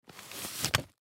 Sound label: Camera Case Pull Camera Out, Digital Olympus Camedia; C720; Camera Sound Effects; Technology